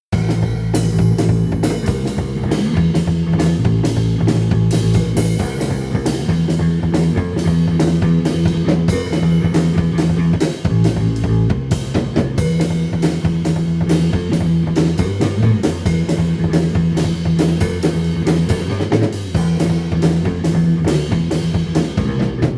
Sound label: Bass part